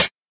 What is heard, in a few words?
muffled pedal hi hat one shot C# key; C sharp